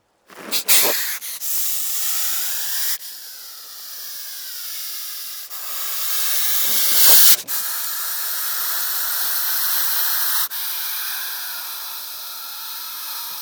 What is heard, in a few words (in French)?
vipere